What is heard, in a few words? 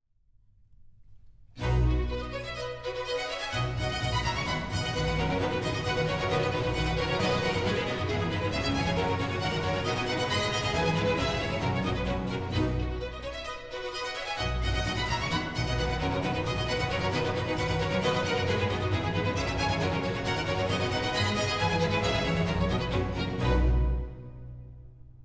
Recit, Sinfonia; Act II; Ben a ragion; Ottone; Handel; Ottone, re di Germania; Ottone, HWV 15